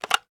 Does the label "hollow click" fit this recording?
Yes